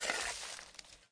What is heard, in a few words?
Sfx - Plant